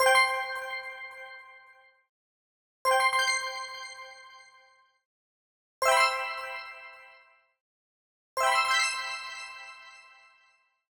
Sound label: Game Points